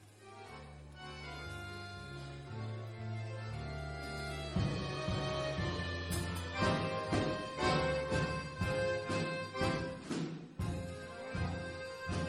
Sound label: Heavy tango